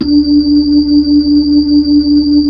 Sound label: B-3, Hollow; Keyboards of The 60's and 70's